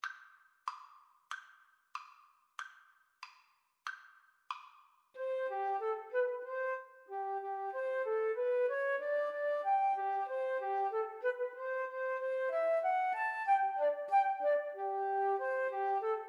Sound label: flute duet